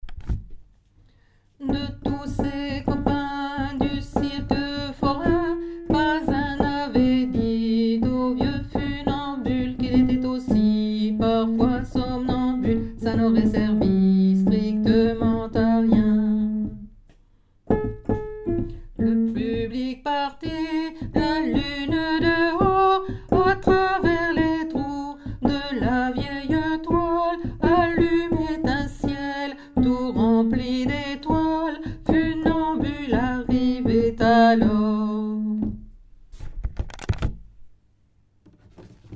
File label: alto